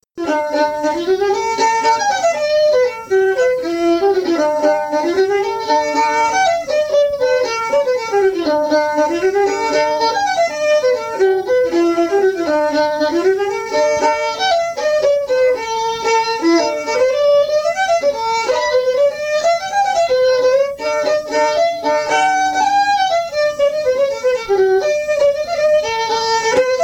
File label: dashing white sergeant fiddle; tune tutor; SeamusGrantFestival